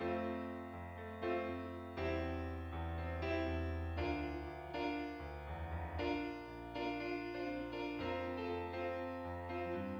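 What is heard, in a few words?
no drums, instantaneous mixture; SiSEC11; underdetermined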